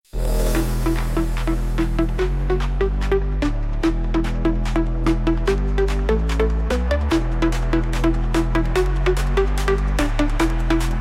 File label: Firefighters dubstep